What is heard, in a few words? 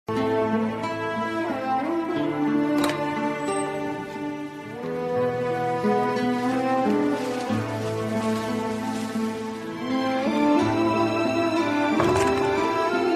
sad violin piano ringtone